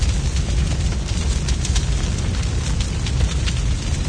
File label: flames